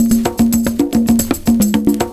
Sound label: PERCS, 70 s Breakbeats